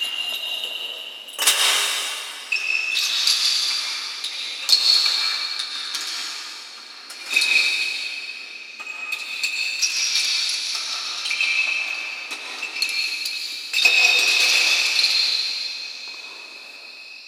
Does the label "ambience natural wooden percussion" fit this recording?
Yes